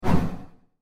Iron Palm Droid Kung Fu Strike; Robot Sound Effects; science fiction sound effect

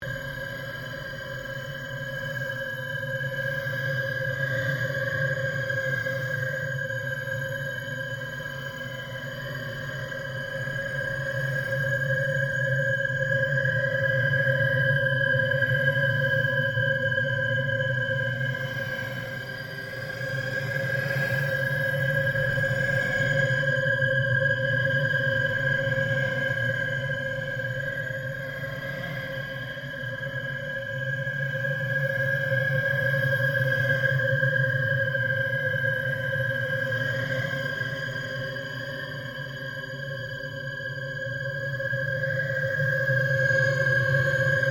drone long, Various sweeps, pulses and drones; Drone Sound Effects; Sound Design

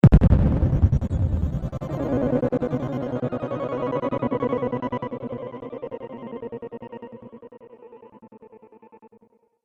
Digital Detonation Trailer Hit; Twistedtracks

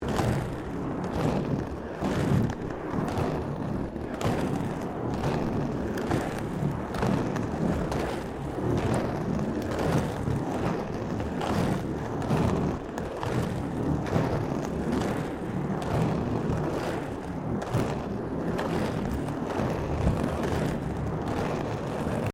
Rollerblading Paving Rough Loop; Sports

